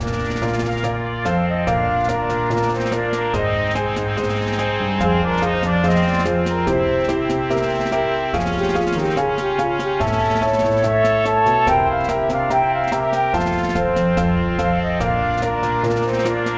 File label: March; MTWFC VOL2 Parts1&2 Audio Accompaniments